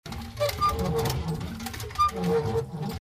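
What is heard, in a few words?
creaks of a gate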